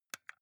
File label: console controller xbox arrow key press